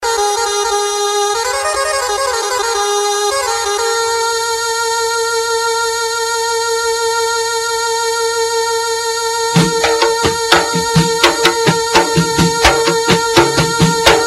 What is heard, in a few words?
saz ringtone; bollywood ringtones